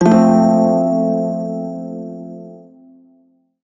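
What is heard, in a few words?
Shawzin Major Chord; Warframe; Prime Shawzin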